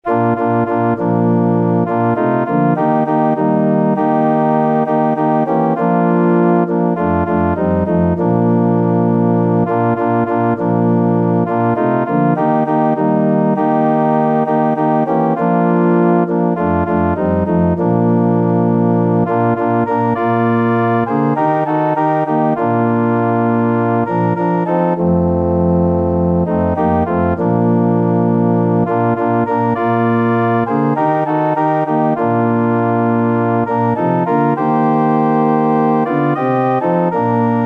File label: ORG, organ